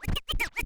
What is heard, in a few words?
Cuts, Vinyl Record Samples